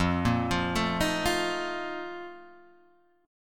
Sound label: Bb Major 7th Suspended 4th, F, chord